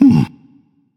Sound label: notice; danzaburou